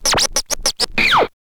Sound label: scratch short, Vinyl scratches